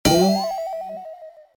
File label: defeat